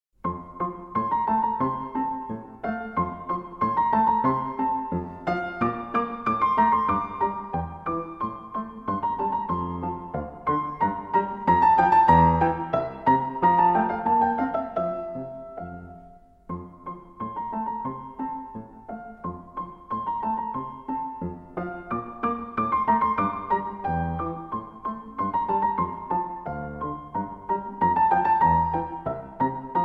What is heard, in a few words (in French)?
piece pour piano